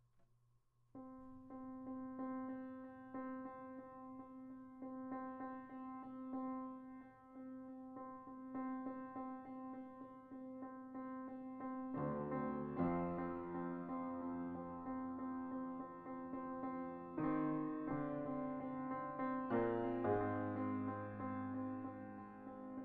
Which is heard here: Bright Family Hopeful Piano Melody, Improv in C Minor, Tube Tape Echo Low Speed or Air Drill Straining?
Improv in C Minor